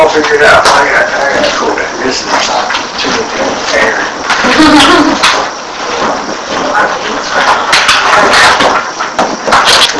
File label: Master Bed Room Unexplained Odd Laugh AMPLIFIED